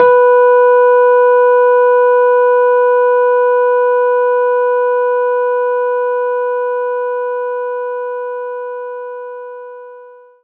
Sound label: Rhodes B3